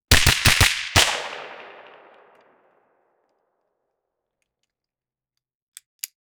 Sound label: single 9mm gunshot